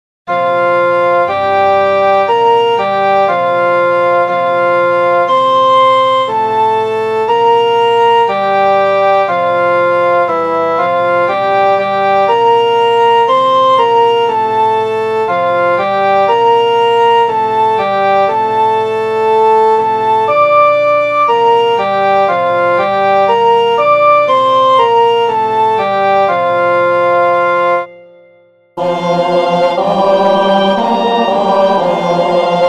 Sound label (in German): instr; jahreslosungen; losung 2021